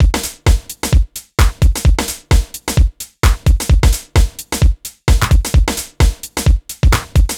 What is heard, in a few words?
Solid Loop 130, Loungin House; Equipped Music